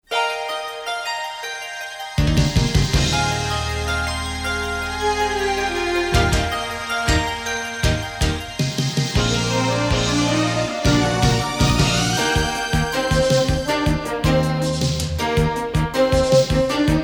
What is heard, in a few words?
Ending Instrumental Version; DB; Dragon Ball Z GT; Bola de drac